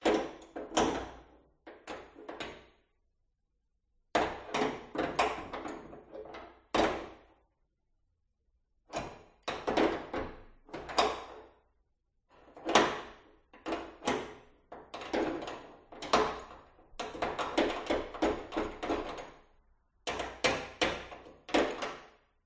metal clunks heavy tool hitting on metalic surface garage roomtone; Sound Ex Machina